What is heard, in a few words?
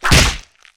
boss tail whip; bittering rancor